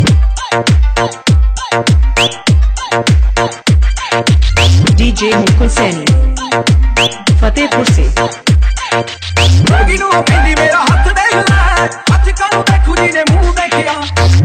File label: new dj music ringtone